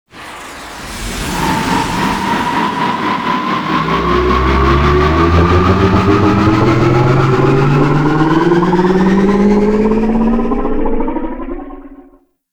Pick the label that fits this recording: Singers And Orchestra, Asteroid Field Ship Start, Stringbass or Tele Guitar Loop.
Asteroid Field Ship Start